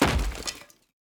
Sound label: environment object barrel breaking